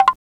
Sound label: wood duhdah; sgi